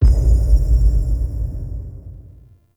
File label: Title Card Start Transition; Warframe; NineteenNinetyNine